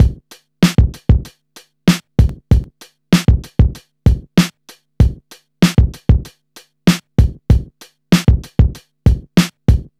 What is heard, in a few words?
96 bpm breakbeat sample b key